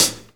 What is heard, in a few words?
HH TUFF; E-Lab; X Static Goldmine 2; akai